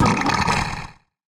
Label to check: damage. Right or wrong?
Right